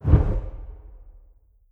ex dimensional portal thunder; Stellaris; other entities

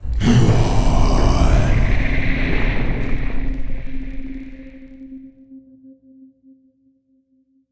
lithoid levitating; lithoid portrait; Stellaris

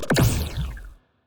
weapon debuff hit; Stellaris; biogenesis; weapons

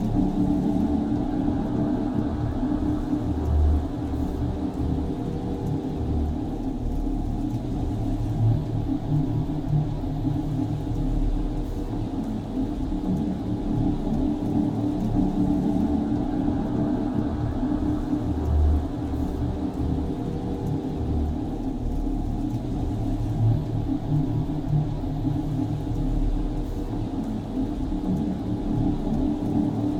ship titan idle; Stellaris; machineage

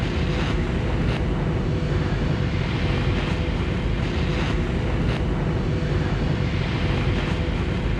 toxic muzzle; weapons; Stellaris; toxoids

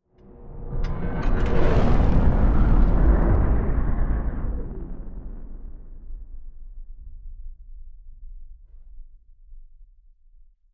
ship battleship move; ships; Stellaris; shroud

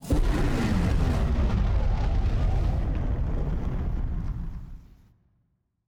kaiju superweapon fire whoosh; biogenesis

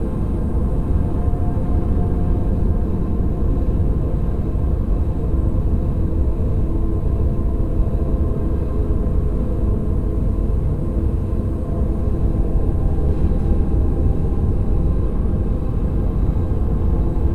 destroyer hum; nemesis; Stellaris

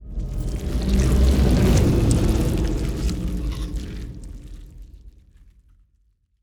ant ship titan move; biogenesis

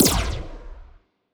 wpn evasion buff muzzle; biogenesis

